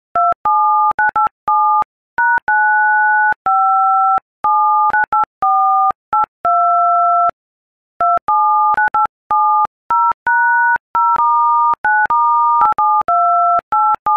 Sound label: harry potter theme song on iphone keypad